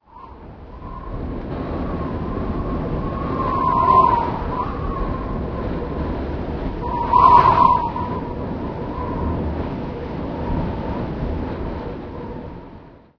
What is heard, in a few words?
wind med, ambient